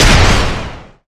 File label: Enemy Hourgrass Minion Active Shot